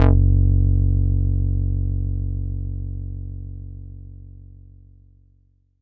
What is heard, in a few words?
Rubber f#1, Korg Poly-800